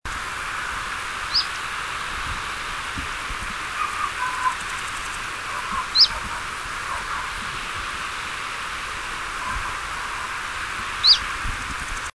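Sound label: Phylloscopus collybita, Chiffchaff strange call; Sörve, Saaremaa, Estonia 16 September 2000; Linnut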